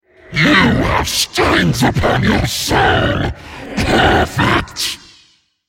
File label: attack; Greenterror; Character Voices - English